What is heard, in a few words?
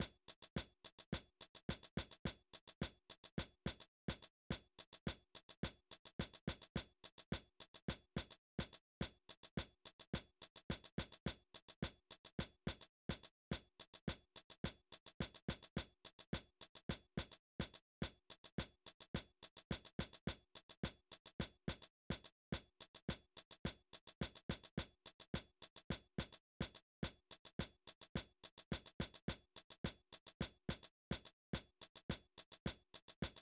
Main Music Hihats; Plants vs. Zombies